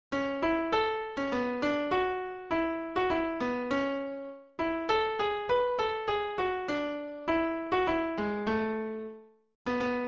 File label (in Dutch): luit improv